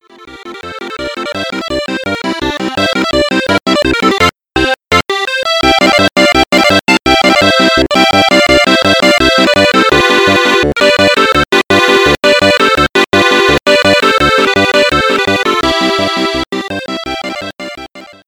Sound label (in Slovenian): cmrlj polka